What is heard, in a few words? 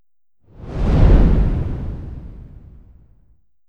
scan sound